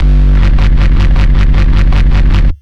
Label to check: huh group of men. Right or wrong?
Wrong